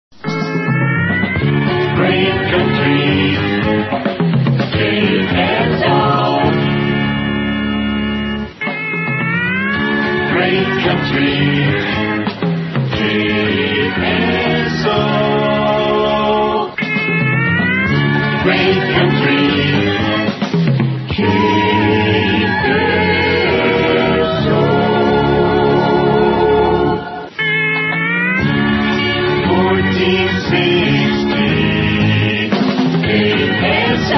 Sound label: great country jingles